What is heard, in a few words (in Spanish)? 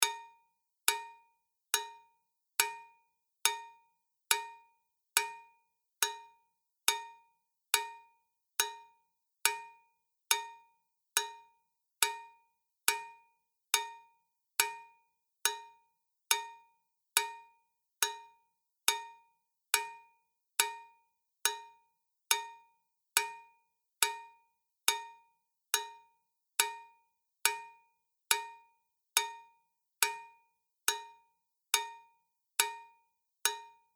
CLAQUETA; ADIOSES SHOW CHOIR; THE CASSETTE EXPERIENCE